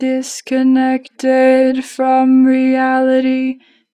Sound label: Disconnected, Harmor Vocals; 2 bar flat; Pitch Flat